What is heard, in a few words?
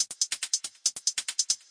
140 verby click closed hats, drum loops and breaks